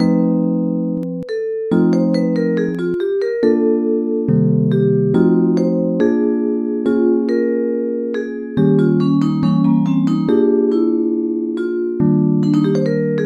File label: 12bars Vibra